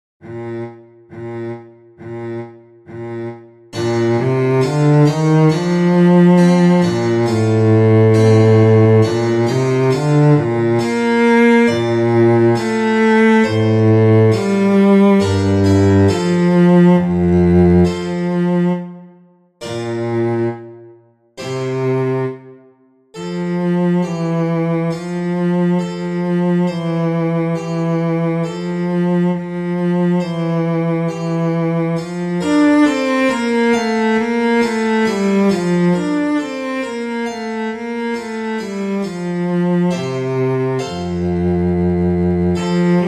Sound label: Cello Sonata, cello music; Vivaldi; Cello Sonata1-4; チェロソナタ第1番第4楽章